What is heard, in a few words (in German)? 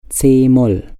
c-moll